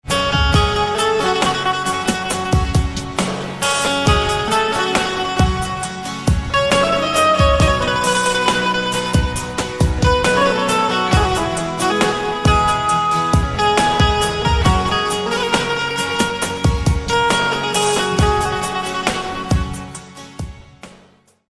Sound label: Banjo, instrumental ringtones